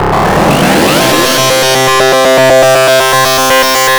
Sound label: GRUNGE